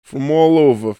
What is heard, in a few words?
PEOPLE